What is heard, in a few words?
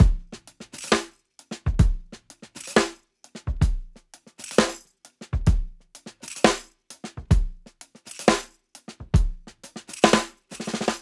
TRIPLET-EY PHRASE TIGHT, TIGHT KIT; Tuna Beats